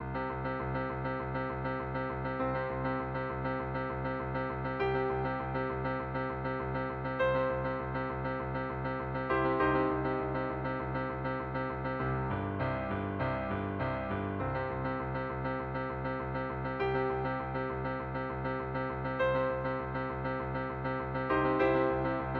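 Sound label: strauss zarathustra, piano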